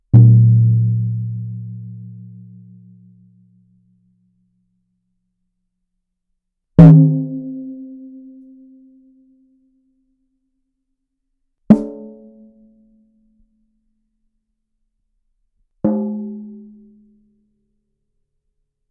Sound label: tom-tom frequencies